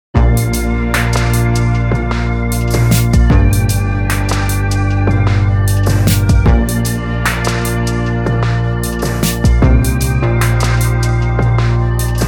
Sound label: (INSTRUMENTAL)